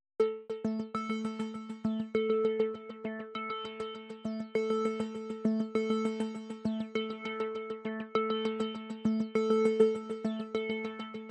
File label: Synths